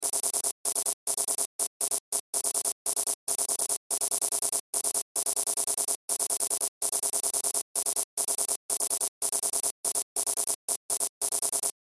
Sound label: Sci Fi Type Beep Cursor Loop; Control Panel; Blastwave